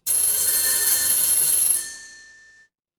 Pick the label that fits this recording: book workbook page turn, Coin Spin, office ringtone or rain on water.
office ringtone